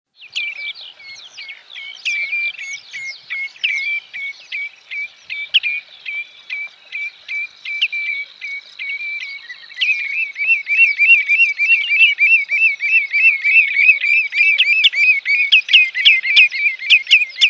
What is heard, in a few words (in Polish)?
krwawodziob, birdsounds